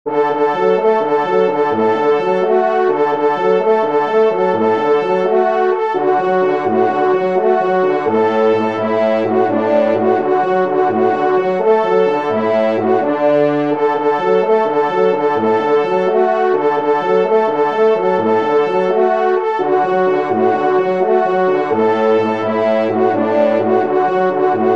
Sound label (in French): Pot Pourri, trompe; 3e Trompe